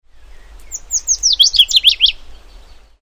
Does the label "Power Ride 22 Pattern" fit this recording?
No